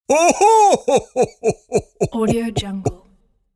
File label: cartoon large animal laugh